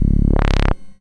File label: SID Bass C#, chip; CHIPSHOP HITS